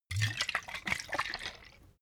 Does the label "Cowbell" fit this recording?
No